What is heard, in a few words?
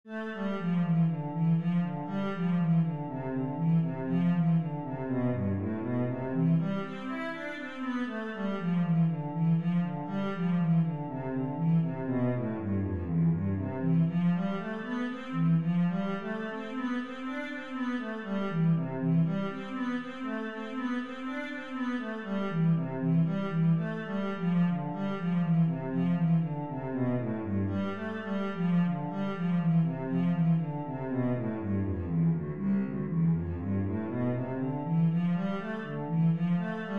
etude, cello